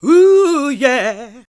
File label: GOSP. Singin